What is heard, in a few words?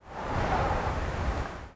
wind gust